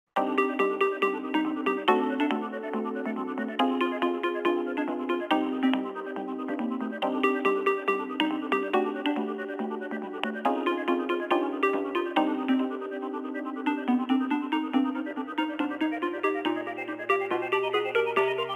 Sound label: ringtone; luigis mansion 3